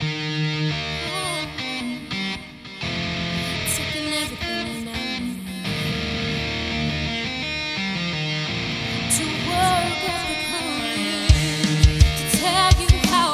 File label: rock ecstazy, Heartbeat Rhythm